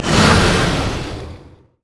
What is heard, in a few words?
dragons descent; LoL; shyvana